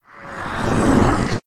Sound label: ghost; kult